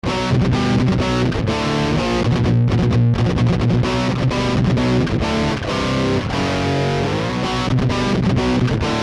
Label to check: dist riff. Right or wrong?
Right